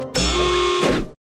horn nose; oggy

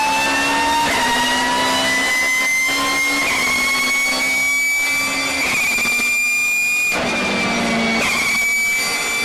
drill sound

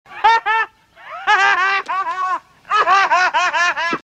big laugh

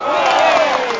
crowd admiring

